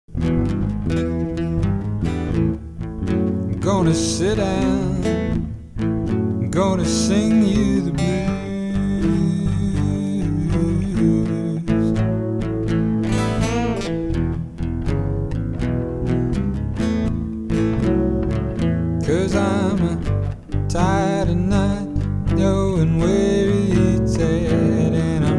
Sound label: Blues